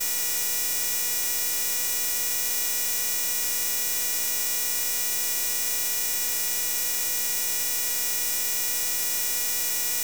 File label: Kitchen Outlet By Stove; Electronics Of My Great Grandma's House